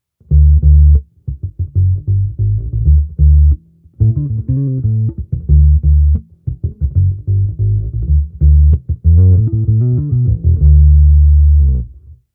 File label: Vintage DI